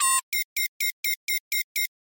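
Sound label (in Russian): сигнал о неверной установке объектива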